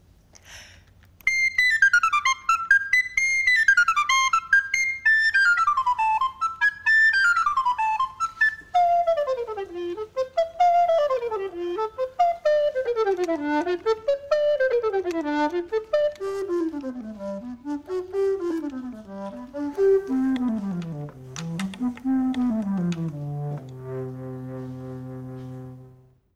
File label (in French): flute a bec registres